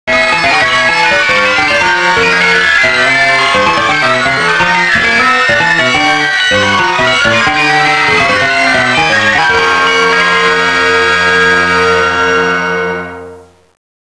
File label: FUNK